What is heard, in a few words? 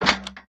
artillery large inventory pickup; factorio 2.0.55